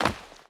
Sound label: Stone Land, Footsteps; Free Fantasy SFX Pack By TomMusic